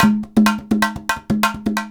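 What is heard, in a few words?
emelabo grooves; Best Service; World Colours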